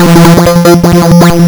lsdj waves; wavetables

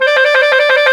WND TRILL, Clarinet FX

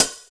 DMA XD-5, DIGITAL

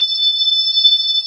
B3 Hi Slow A4; Proteus 2000; Digital Sound Factory Proteus 2000

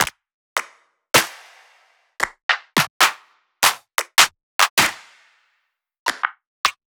Two Shots Clap

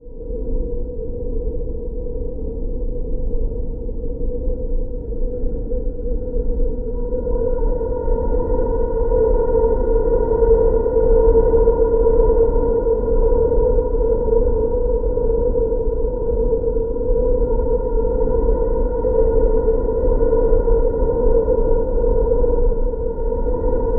ARTIC, DRONES; Zero G; Malice In Wonderland

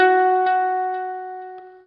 NOODLE LONG, Guitar & Bass

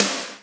Snare, Ensoniq ASR-X

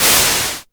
NOISE FX; Back In Time Records; Big Bang; SFX

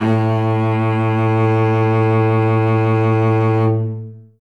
cello solo A1; Best Service ProSamples vol.51 - Classic Orchestra 2

